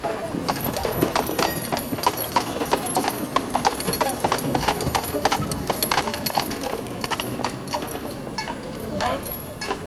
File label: historical atmosphere